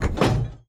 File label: Aircrafts Drop Weapon In; DCS